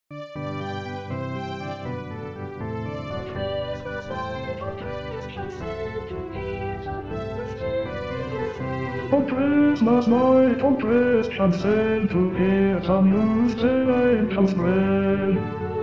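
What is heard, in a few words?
Sussex Bass; 2021 Xmas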